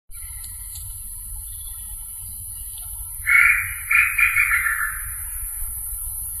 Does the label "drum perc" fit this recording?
No